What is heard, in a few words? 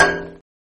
metal plate